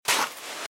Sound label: Footsteps Sand Dry Walk Left; RadwinIsland